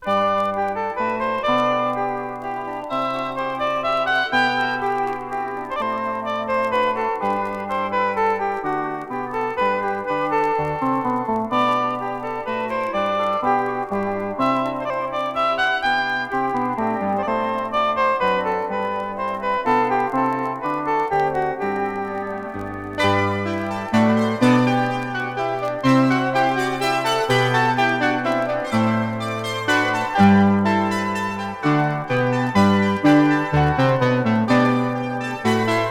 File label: Minuet in G Major; Suite From Anna Magdalena Notebook